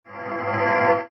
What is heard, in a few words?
gong